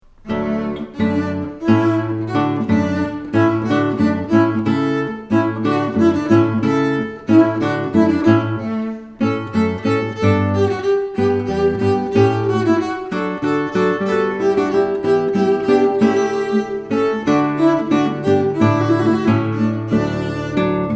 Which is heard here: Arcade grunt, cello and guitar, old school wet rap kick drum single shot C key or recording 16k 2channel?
cello and guitar